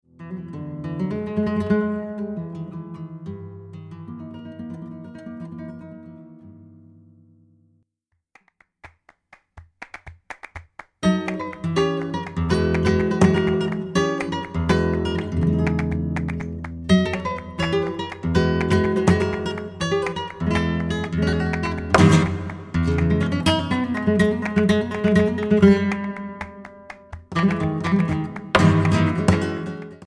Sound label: rondena bulerias